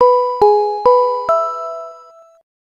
Announce, notifications; Fujitsu F-42A

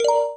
Movie Shot start; LG KU385; Event sounds

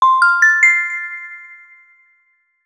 Alert; Tyrangel Alert; Sony Ericsson; PlusFourSix; Tyra (G900)

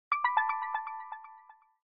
Ripples, notifications

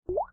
HW Touch; Samsung Galaxy Pop SHV-E220s

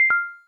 cam stop; LG; G2-Mini; camera

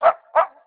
Alarm; Audiovox; CDM-9500

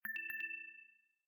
Low Battery; LG; Viper LS840

